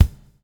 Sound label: BUBL, Backbeat; Spectrasonics - Backbeat